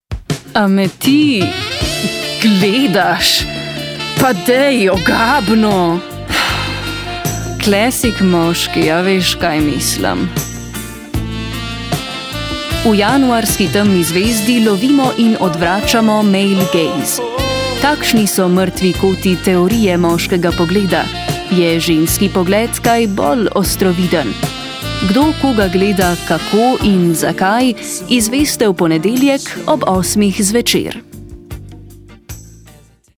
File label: jingle; male gaze; temna zvezda